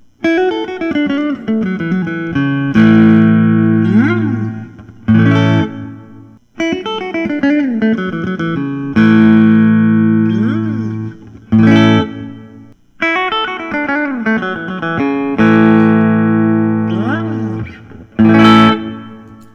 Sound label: thinline telecaster dirty lemon; gil yaron